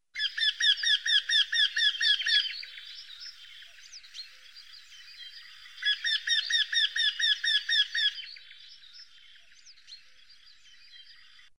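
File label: vertisheyka voice, wryneck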